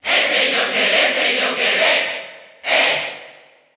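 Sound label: Cheer Spanish, crowd; Joker; SSBU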